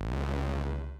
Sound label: chip bass